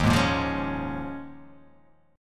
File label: D sharp 7th Suspended 2nd Suspended 4th